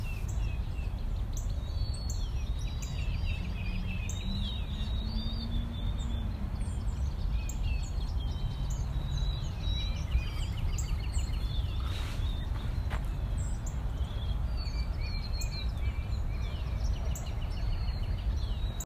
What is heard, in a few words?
central park bird sounds